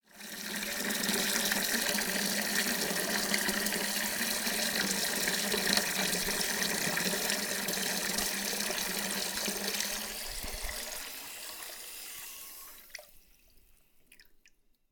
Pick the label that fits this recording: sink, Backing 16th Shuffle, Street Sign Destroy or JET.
sink